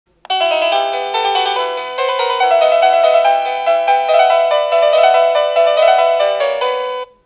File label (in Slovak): Ukážka melódie zvonenia zvončeka